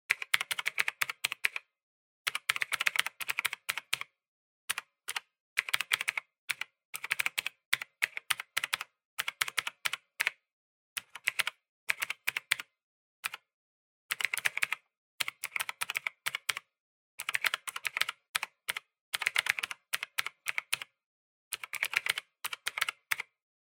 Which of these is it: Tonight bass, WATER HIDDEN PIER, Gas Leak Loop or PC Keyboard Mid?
PC Keyboard Mid